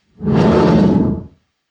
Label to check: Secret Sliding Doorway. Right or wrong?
Right